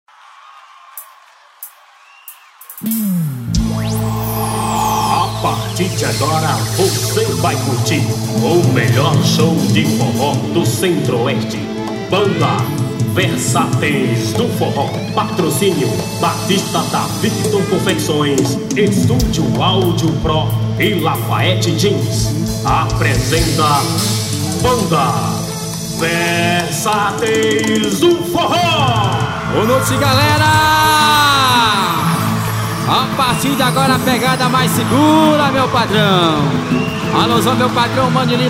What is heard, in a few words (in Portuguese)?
abertura, forro